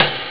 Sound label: roll; Amiga Soundtracker Sample Packs